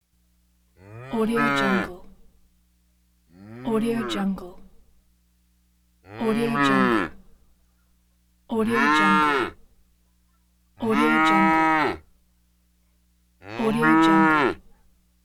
cow

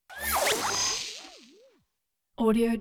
squiggle beam pass bys; prosoundfx

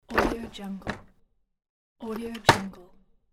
drawer closing

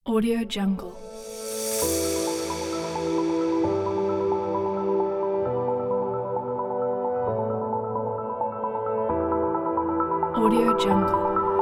future technology

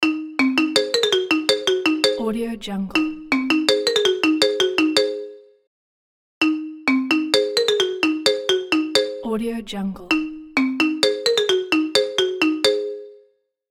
marimba ringtone

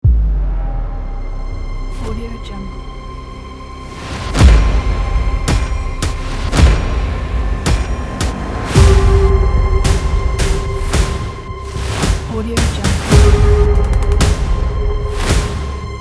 cinematic trailer teaser

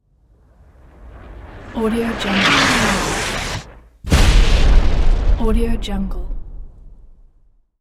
incoming missle and explosion; dubstudio

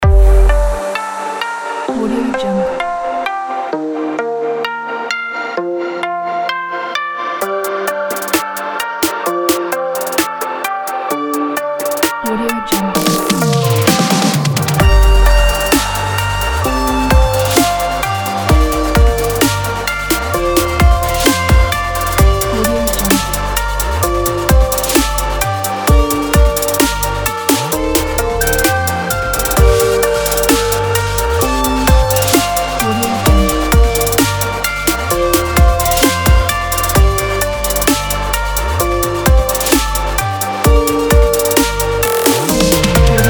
blue edm; paulosousaproduction

